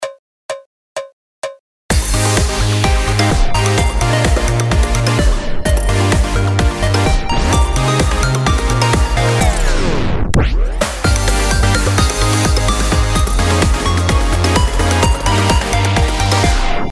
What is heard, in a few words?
loop Mash Tape Stop